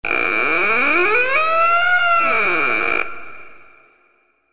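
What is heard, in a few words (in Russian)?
звуки дверей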